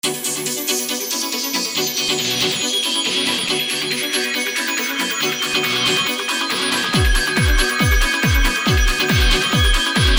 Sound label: Guitar